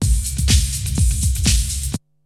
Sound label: LOOPS, TECHNO; Syntec; Wall of Sounds; TECHNO-BOX